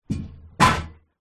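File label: Metal Tool Bang Wood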